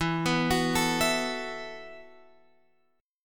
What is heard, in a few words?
E Suspended 2nd Suspended 4th E, Guitar, Open F; Esus2sus4; arpeggiated; x,11,11,13,12,11